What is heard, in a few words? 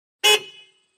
Montero Horn Beep, 1998 mitsubishi montero ext.